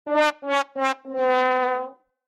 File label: fail